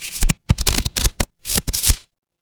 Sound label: paper fabric card shuffle